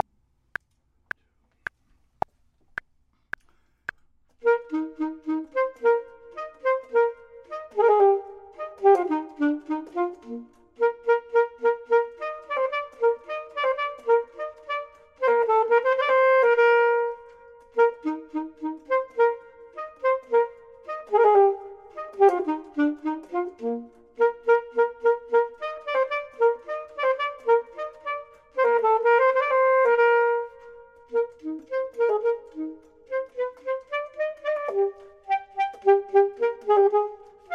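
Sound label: top, Saxophone and Clarinet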